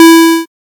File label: safe; inside-house